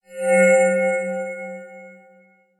SFX portal onoff

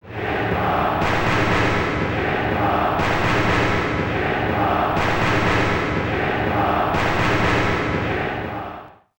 Chants - Nippon ChaChaCha

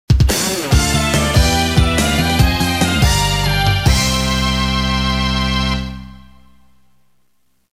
Clear Version (Stage Clear); Konami Shooting Collection; Our Wonderful ~Yahho!

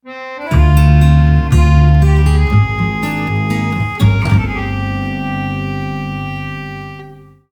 Match Lose; Glass Joe

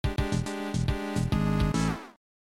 Level Start; Lollypop; Amiga

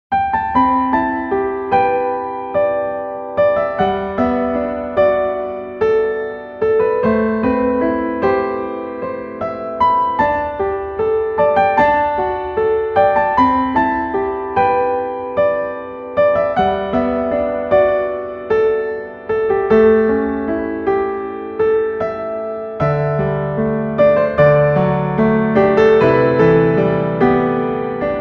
Theme Piano; Kiss Ato Kiss Will Change My Relation With You

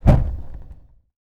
fireplace gas flame ignite; a dump in the dark

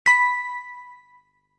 Ping; Wild Animal Racing

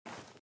run sand

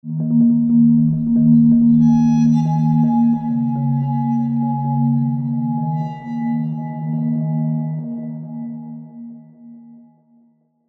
Marimba and Cymbal; Marimba and Cymbal Explore Match Intro 2

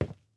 footsteps WOOD; PC Building Simulator

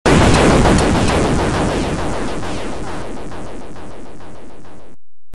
Boss SFX; Xak II Rising of the Redmoon; PC-88

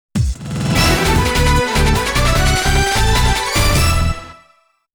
Stage Clear; 20th anniversary rockman x sound box 2013

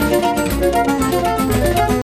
mus cage forcefield; jungle world

